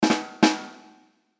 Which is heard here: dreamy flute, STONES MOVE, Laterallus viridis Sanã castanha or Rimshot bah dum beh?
Rimshot bah dum beh